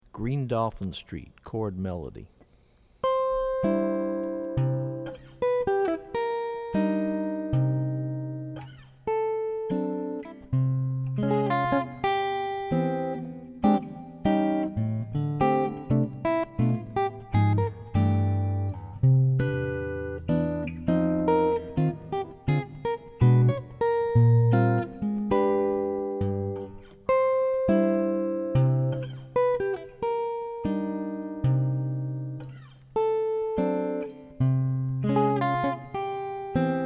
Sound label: Chord Melody; jazz tunes